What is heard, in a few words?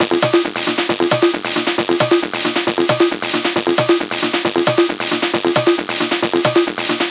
Perc Loop 135, Techno